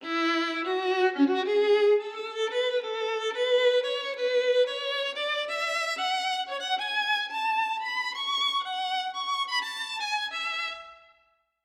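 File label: violin vibrato max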